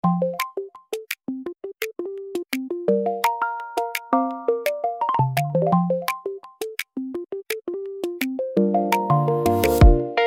Light Steps reduced, percussion

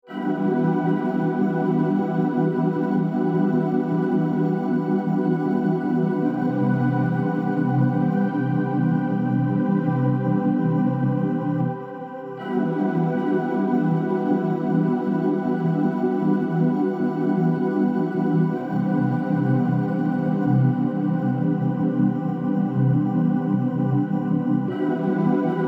ambient; ruhig-majestaetisch

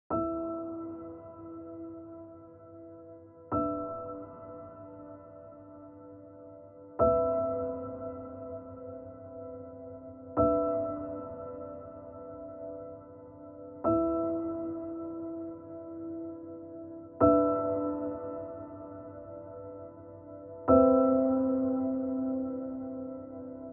piano solo

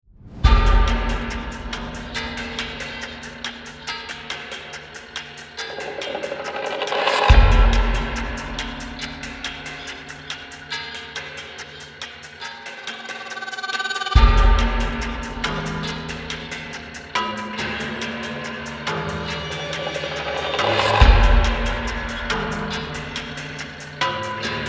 suspense percussion; reportage

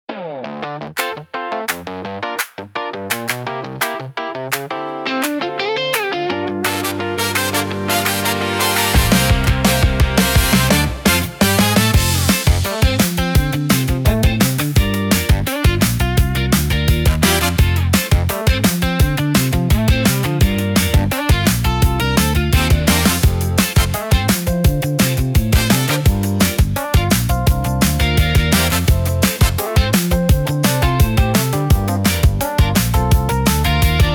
Groove Candy dynamic, opener

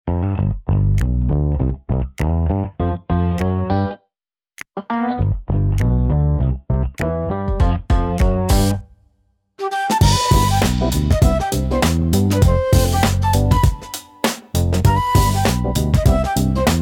Flute

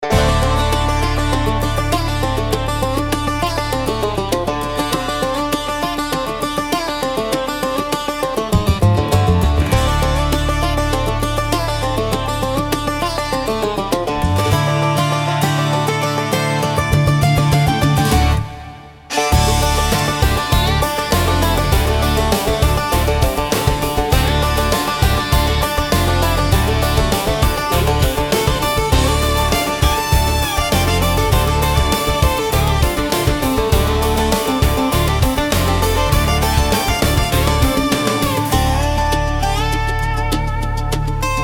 Bluegrass Junction; nordamerika

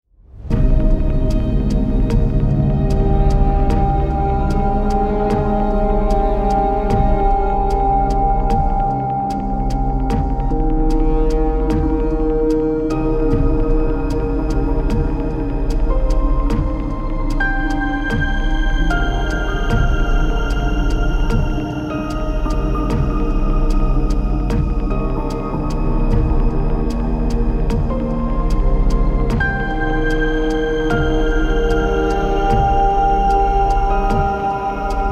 rhythmic suspense; schockierend

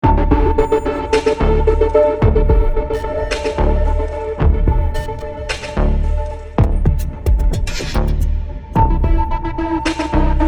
rhythmic, suspense

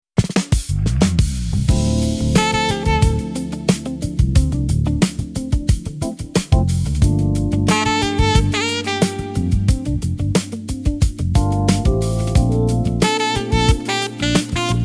sax; rnb

